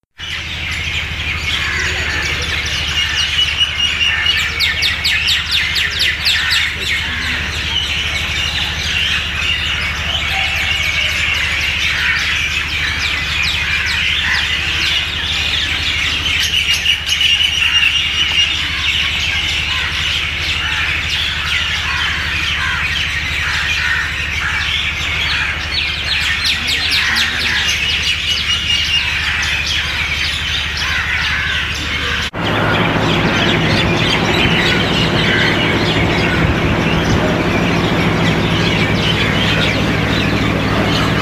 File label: Birds